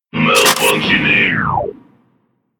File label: death